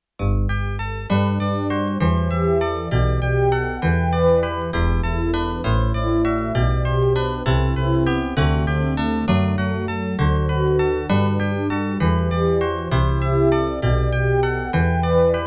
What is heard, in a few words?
Jesu joy of mans desiring